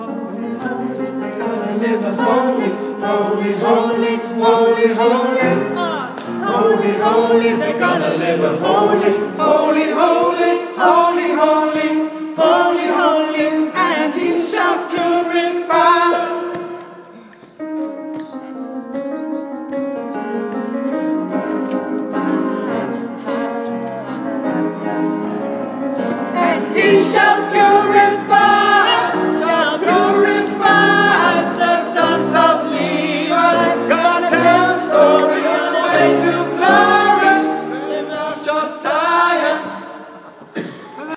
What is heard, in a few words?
Tenor